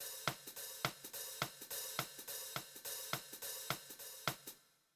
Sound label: music; Match Minnie